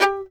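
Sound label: fiddle staccato G4; Sampleheads; Whole Lotta Country 1 & 2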